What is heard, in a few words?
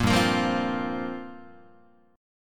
G Minor 11th G down Guitar; Gm11 strummed; JGuitar